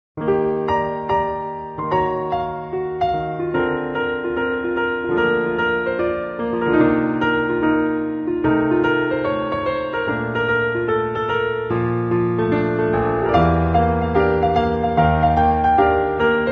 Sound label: piano ver.